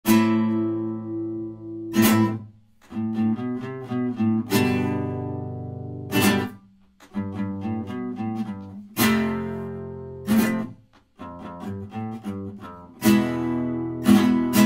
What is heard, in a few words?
Easy Flamenco Guitar; tutorial for beginners